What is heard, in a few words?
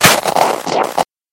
monster snowman